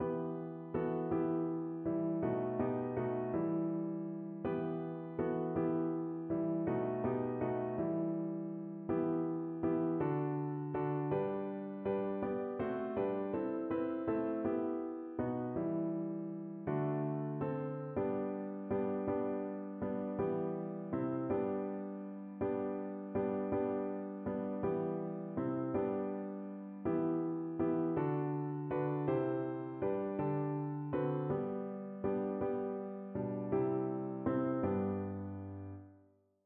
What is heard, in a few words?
we three kings piano